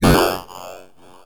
Access Virus B; Synthworx

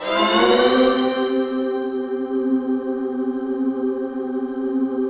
RISE UP, Synthy Tonal; Distored Reality

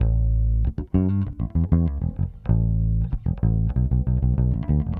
Bass, 70s Analog Funk

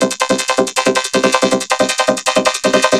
Vocobeat; Sonic Foundry (Sony Creative Software)

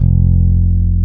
JAZZ F, bass; Spectrasonics; Bass Legends CD 1

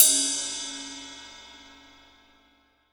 20 TIP, 20INCH SAB RIDE

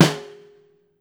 STR SNARE, Brazil Drum Perc

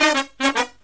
Brass Riffs

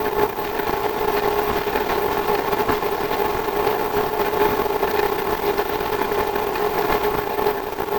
Drone Ambient; Drums & Drones

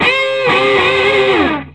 SOLO, Vintage Blues Guitar

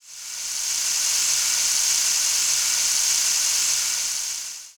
Rain Stick; Sonic Foundry (Sony Creative Software); Joe Vitale Latin Percussion

